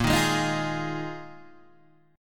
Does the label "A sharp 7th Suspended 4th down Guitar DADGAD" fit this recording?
Yes